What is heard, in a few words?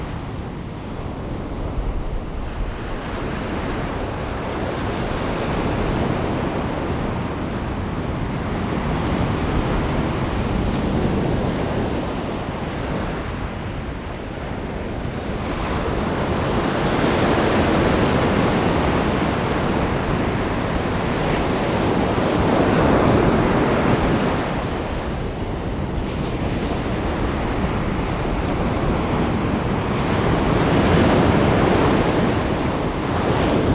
Nature Sounds Ocean Waves